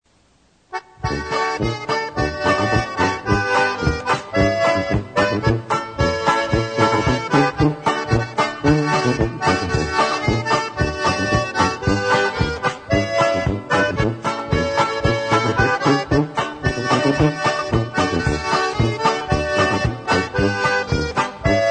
POLKA

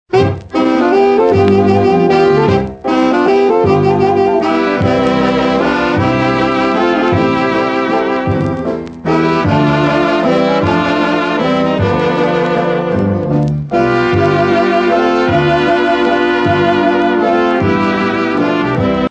WALTZ

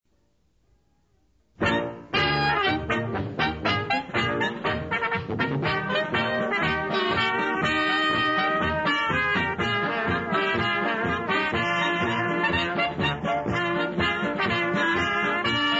COME OVER POLKA

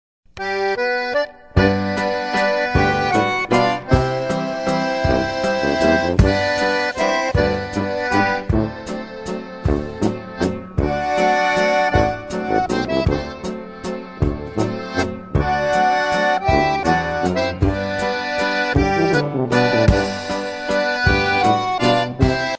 waltz

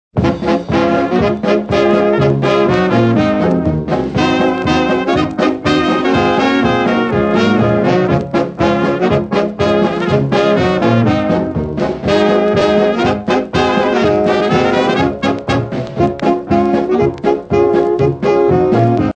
POLKA

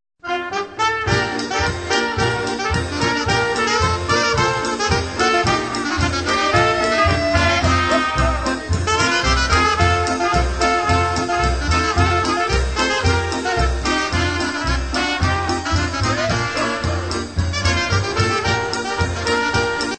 TICK TOCK POLKA

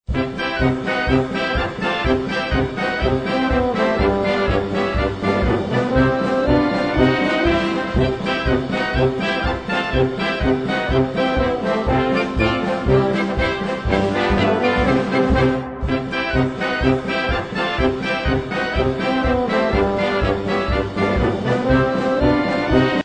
POLKA

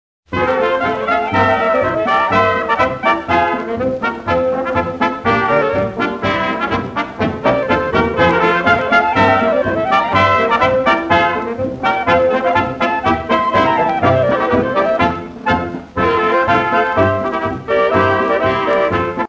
EVERGREEN POLKA

